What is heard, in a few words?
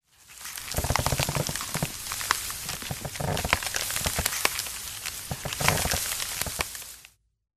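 sound of a tree growing; Короткие звуки для монтажа; transition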